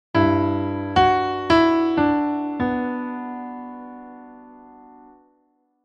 first chord b minor triad

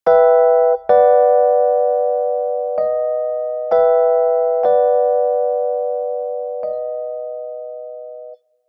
chords

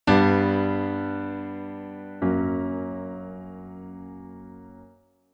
g sinks to f sharp

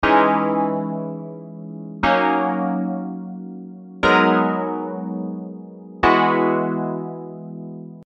HARMONY

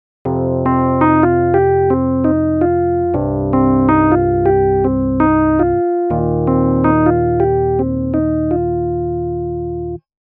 Climb scale segment

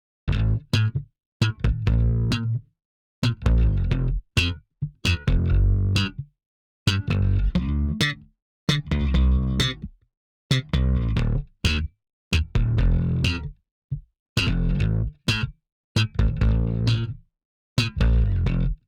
Bass

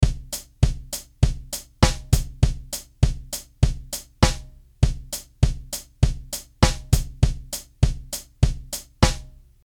PreChorus

